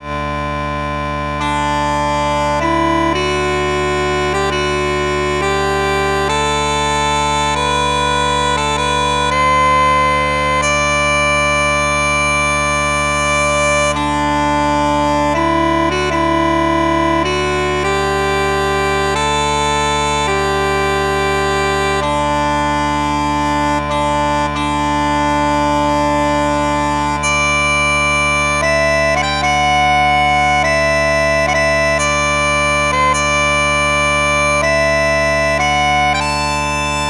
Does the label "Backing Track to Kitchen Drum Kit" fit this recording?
Yes